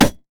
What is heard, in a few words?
blue minion melee basic attack; LoL